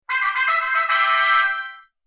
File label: Fanfare Sound Effect; Eglon Ehud Story; Kings & Kingdoms